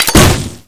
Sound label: cluster nade; garrysmod